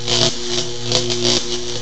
brenn; puzzomania

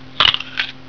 BISS; 999wavs

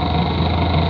WALZE; DRAGO

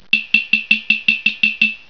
KLOPFEN

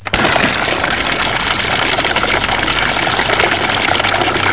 Brunnen; 999wavs

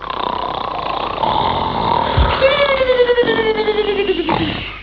SCHLAF; DRAGO

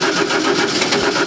Anlasser; DEMOUK

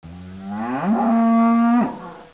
KUEHE; DRAGO

